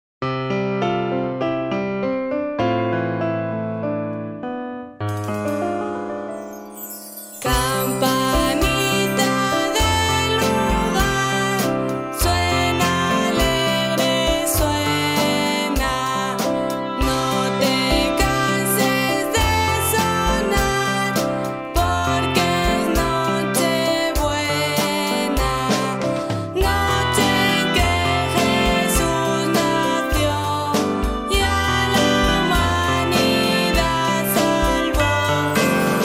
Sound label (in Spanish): Campanas